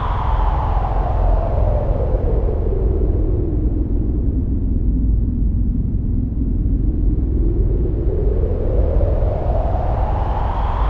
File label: VFX Atmos